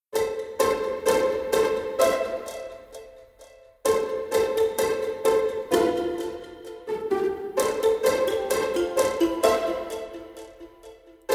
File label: strings